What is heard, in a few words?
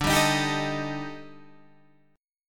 D sharp Major Sharp 11th, D